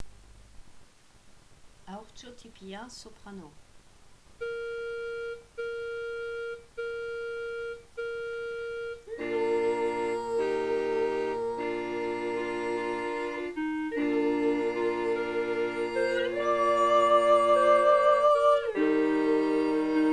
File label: Training Soprano; Haurtxo ttipia